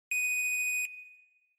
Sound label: Countdown